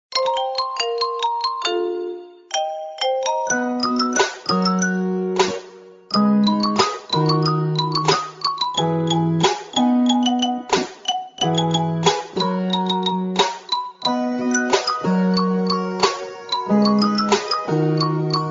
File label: marimba; iphone